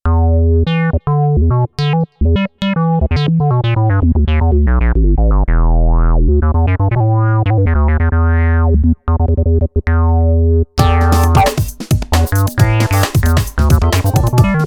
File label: Velo Squ Bass